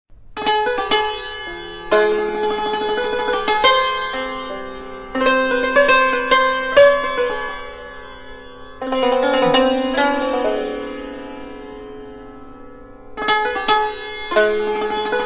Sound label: santoor